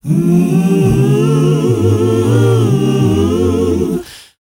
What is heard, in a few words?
mm choir, S. African world voices; ILIO; Vocal Planet